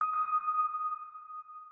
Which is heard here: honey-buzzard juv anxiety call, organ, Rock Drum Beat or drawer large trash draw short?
organ